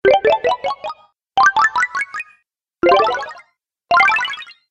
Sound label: Restore Health Collection, Video Game Sound Effects